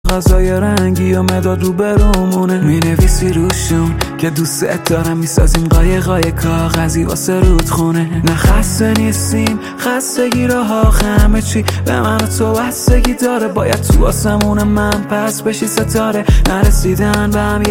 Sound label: Male 128